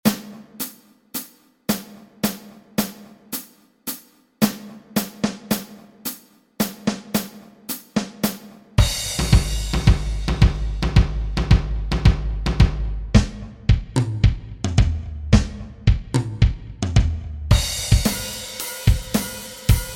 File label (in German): schlagzeugsolo fur anfanger